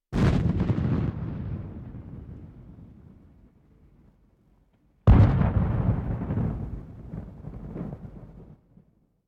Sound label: Military vehicles